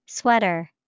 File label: sweater